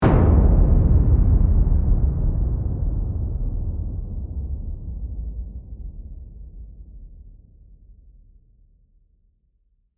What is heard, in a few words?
Hit Drum Descend; Sound Design; BlastWave